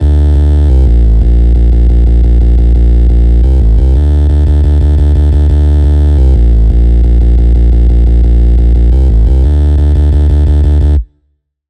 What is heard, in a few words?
Bass; Routed Sound; Spire Expansion - Hyper Gamer Vol.1